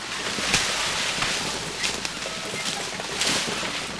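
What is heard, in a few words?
Artillery Run Loop Forest; Civ4 warlords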